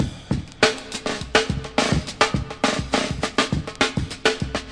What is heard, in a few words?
101, drum loops